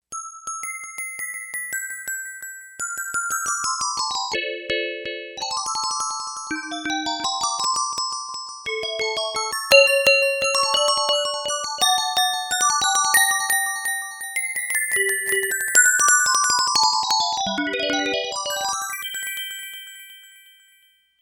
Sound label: Glocken, Yamaha DX11; Factory Performance Voices